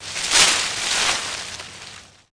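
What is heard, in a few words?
plant rustle, impact; SiN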